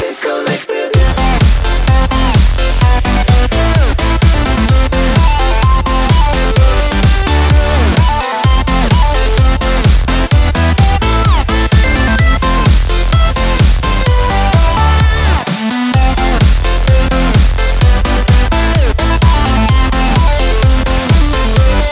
Electro

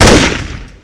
Sg552

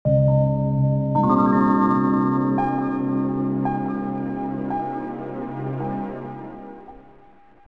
SynthWave

